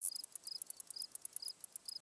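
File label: Pets Insect Large Idle; creatures; Lego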